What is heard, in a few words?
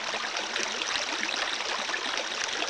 Water; Zelda; OOT